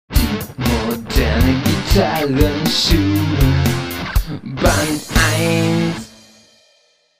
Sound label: Jingle